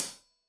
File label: Hat Tight, Hi-Hat, Cymbals; Deeply Sampled Drums